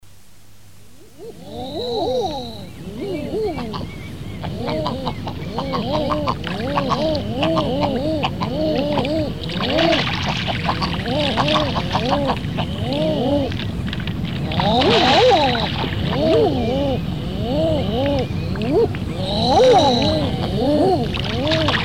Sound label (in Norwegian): aerfugl; fugler